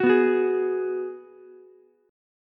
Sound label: Ab Minor 7th down Ukulele; strummed; JGuitar